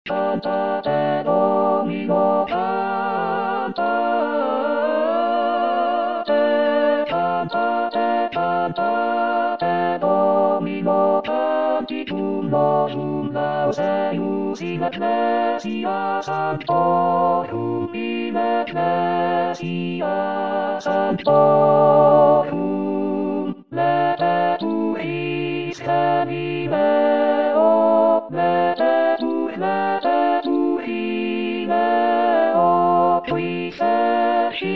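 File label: alto; Cantate Domino; Pitoni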